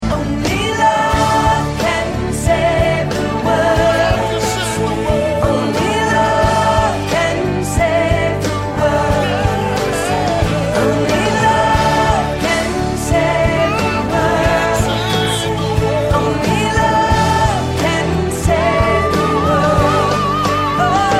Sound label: Ringtone